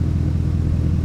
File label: f150 idle, Engine; Cars; XBOX